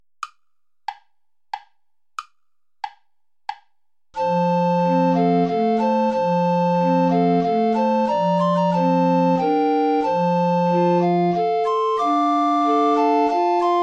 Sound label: waltz play along without tenor recorder